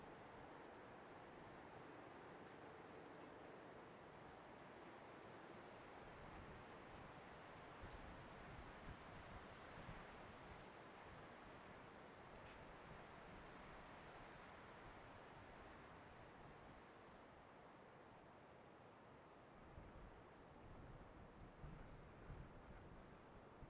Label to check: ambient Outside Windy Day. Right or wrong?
Right